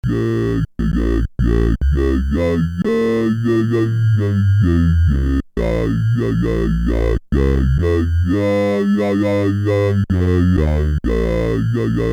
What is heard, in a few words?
minimoog